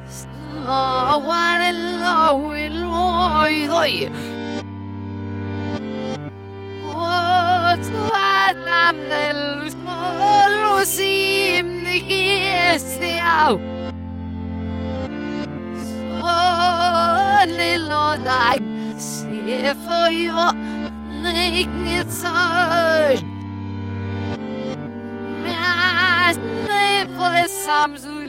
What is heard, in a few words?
music rev